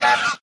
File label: Caw Scream; Lethal Company